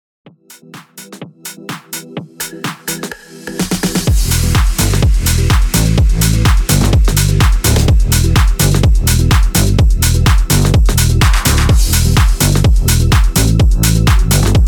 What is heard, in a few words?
126 BPM